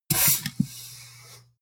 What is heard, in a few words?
pneumatic open